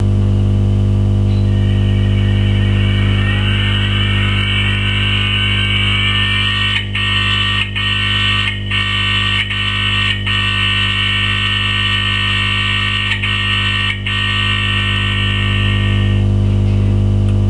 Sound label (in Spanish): problemas de motor